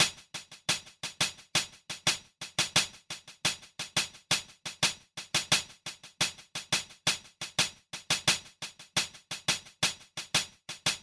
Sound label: Drum Loop - 87 BPM Hihat, Boom Bap; Earth; Cymatics - ANALOG EVOLUTION Beta